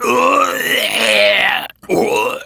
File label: Death, Barks; Kuva Lich; Persona D